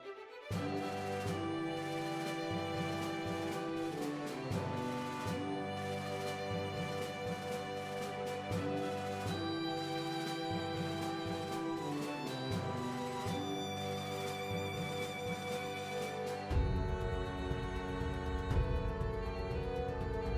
music overworld; Sentry Knight Tactics

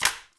Button Activate; Puddle; puddle plus ios gamerip 2018

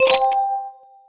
escape unlock level; Ringling Bros. and Barnum & Bailey; Wii

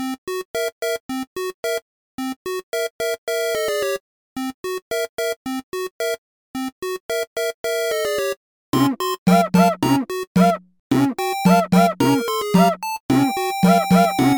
Battle - Comical; Egg Monster Heroes

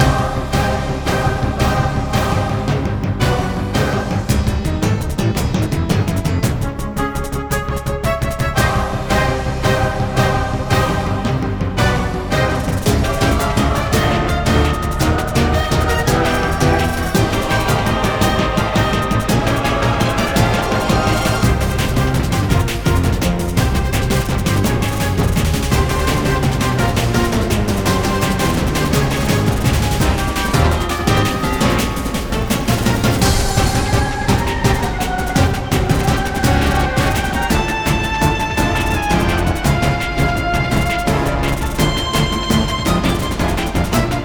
Combat Finalboss 2ndphase Loop

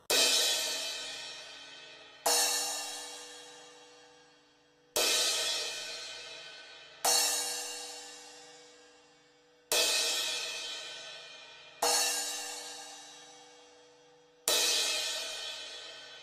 Cymbal; Cymbal Cat; Rock Island

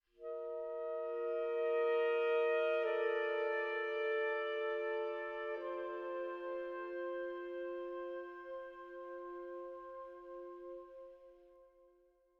Env Sky High Altitude Melody

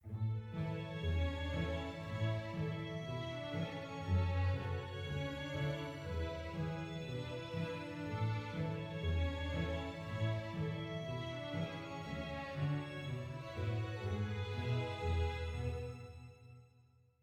happy soft; Quivr; PS4 Windows gamerip 2016

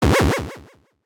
Platform jump; super mombo quest

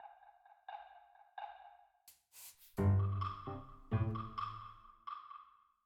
Field Battle Ground Easy

